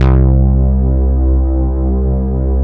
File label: OB LOW P